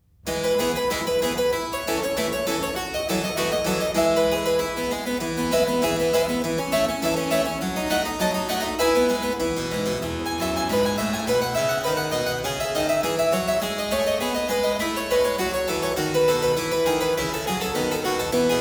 Praeambulum in F major, Keyboard Works; J.S. Bach; BWV 927; Johann Sebastian Bach; Inventionen & Sinfonias

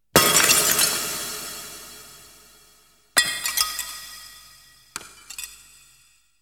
Window Breaking; Halloween Sound Effects

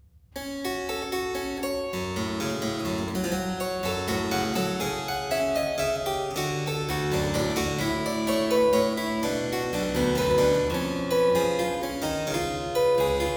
Praeambulum in G minor, Keyboard Works; J.S. Bach; BWV 930; Johann Sebastian Bach; Inventionen & Sinfonias

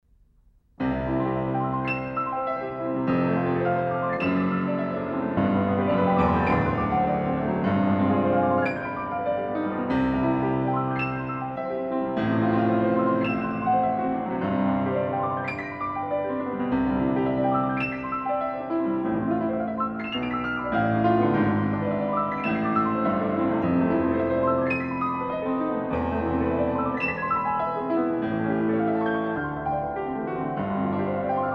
Etude for piano in C major; Chopin- Etudes (Complete)

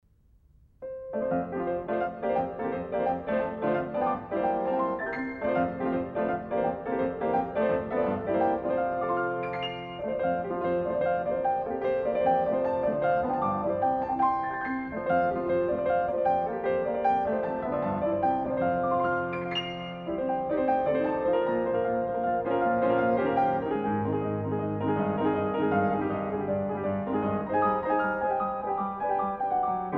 Etude for piano in F major; Chopin; Etude for piano No. 15 in F major ('Cartwheel') Op. 25-3, B. 99-1

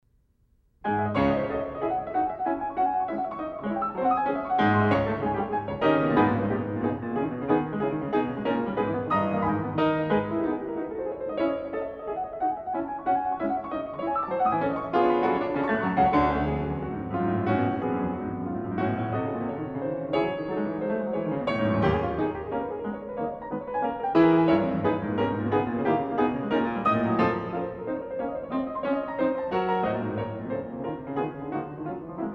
Etude for piano in C sharp minor; Chopin; Etude for piano No. 4 in C sharp minor ('Torrent') Op. 10-4, B. 75

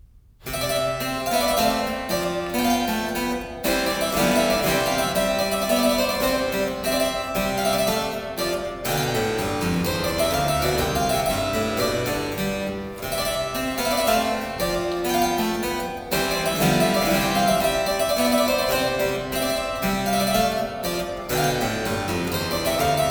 Partita in D major, Menuet, Keyboard Works; J.S. Bach; Partita No.4; BWV 828; VI Menuet

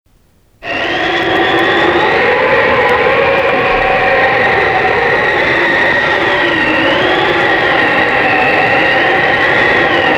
Spooky Wind, Halloween; Halloween Sound Effects

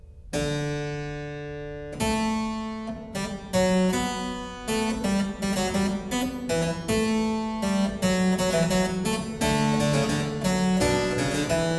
Prelude & Fuge in E flat major, Keyboard; No.7; BWV 876; Johann Sebastian Bach; The Well-Tempered Clavier

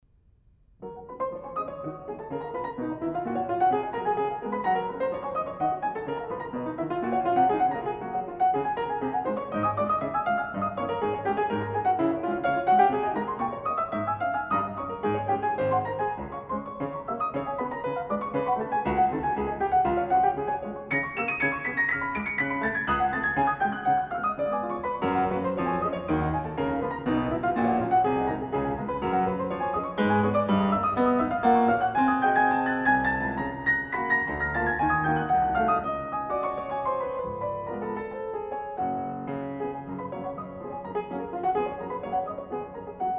Etude for piano in G flat major; Chopin; Etude for piano No. 21 in G flat major ('Butterfly') Op. 25-9, B. 78-5

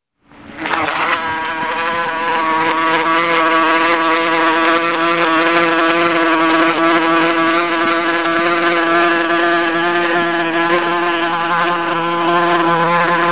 Giant Fly; Halloween Sound Effects

